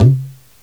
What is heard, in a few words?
gumki, mrid